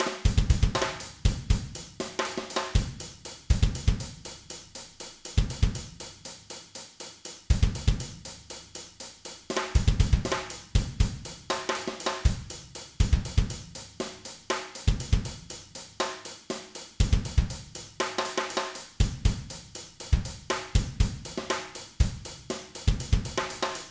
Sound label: Drums